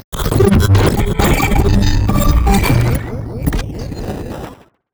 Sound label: portal glitch